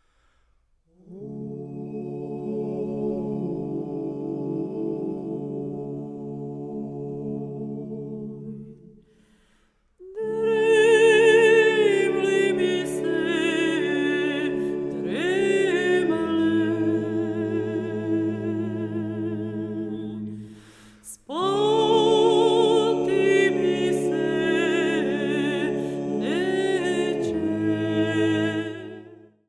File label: acappella; Dremle